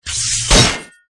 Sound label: damage; hujia